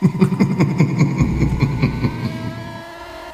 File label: laugh